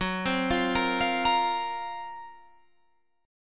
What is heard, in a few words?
F sharp Major 7th Flat 5th, Guitar, Drop D